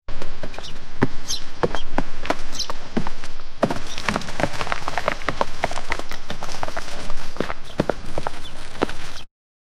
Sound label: tyrannosaurus running in